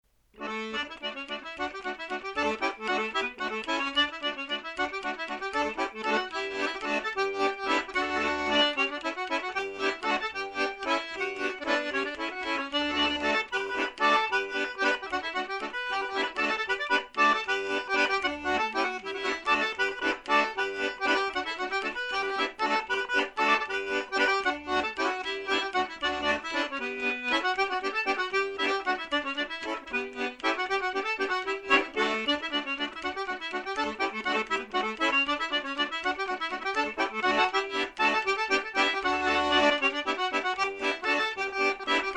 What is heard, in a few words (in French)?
bourree, musique diatonique